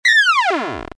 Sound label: Engine Core asleep